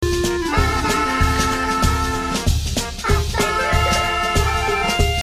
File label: Game Tune